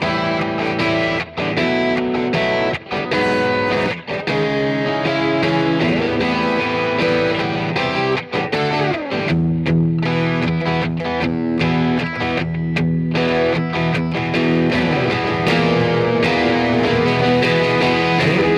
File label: guitars before